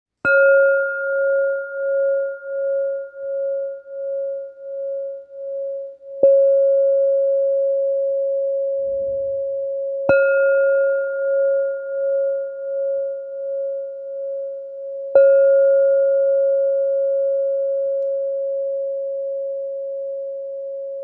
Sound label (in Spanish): Amarillo do 549 hz, cuencos tibetanos